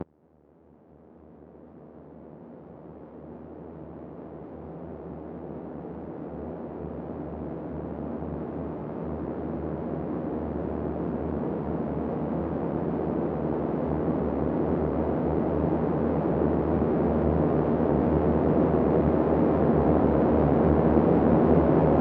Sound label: Drone